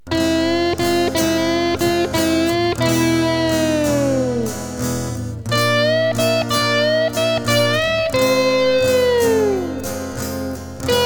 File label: Fm E Bb Fm